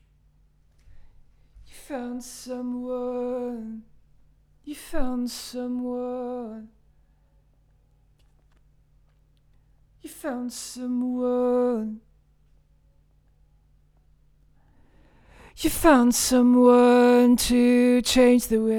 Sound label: Vocal